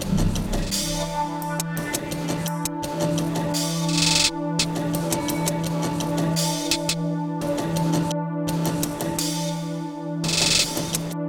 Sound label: Full Mix